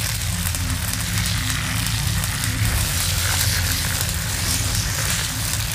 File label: electrical death panel; Titanfall 2